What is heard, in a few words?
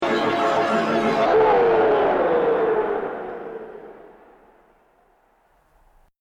carnival; asoundaday